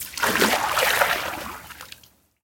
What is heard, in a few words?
deep water step; Golden Crayon Sounds